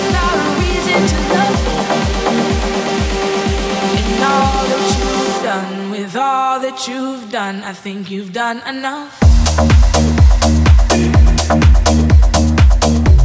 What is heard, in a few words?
English Ringtone